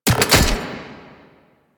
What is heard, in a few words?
Archgun Charged Mech; Warframe; Archwing